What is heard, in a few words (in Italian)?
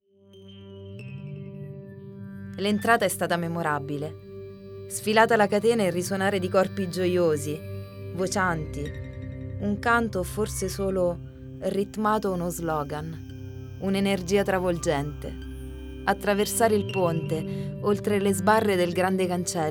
musica voce